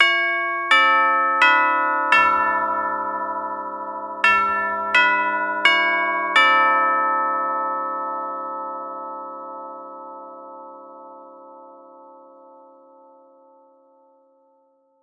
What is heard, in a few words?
household doorbell carillon chime